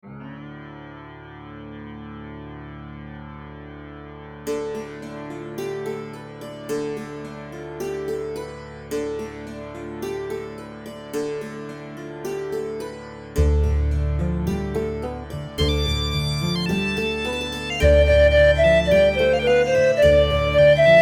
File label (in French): Colinda sans contrechant, flute de pan